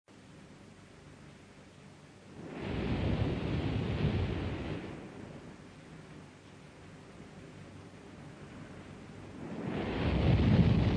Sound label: Ocean Waves Sound Track